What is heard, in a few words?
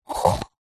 household cup plastic pull from stack; zapsplat